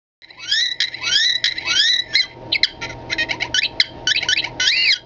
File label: r2d2 sound; swsaga